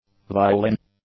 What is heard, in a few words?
violin; nouns